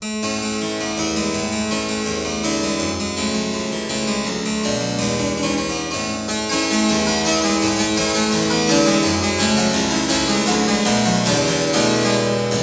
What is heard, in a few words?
Harpsichord Sound